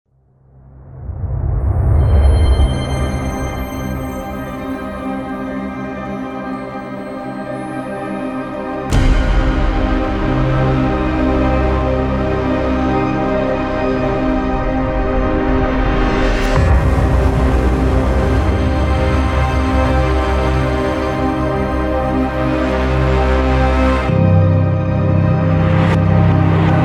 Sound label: cinematic soundtrack; duende sounds evince